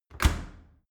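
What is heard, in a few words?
dry cleaner close hard, maschinen türen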